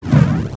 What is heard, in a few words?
Sudden Ice